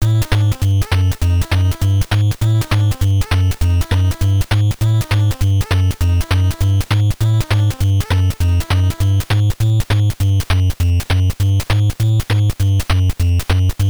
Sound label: RPG Mode Shop; Pinball Quest; nes